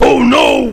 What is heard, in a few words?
oh no hit sound